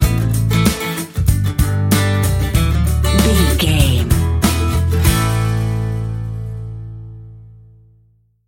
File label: our day have fun bumper